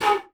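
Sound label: JAPANESE BA, BAMBOO FLUTE; Voice Crystal - Steve Reid Definitive Percussion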